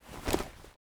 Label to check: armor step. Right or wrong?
Right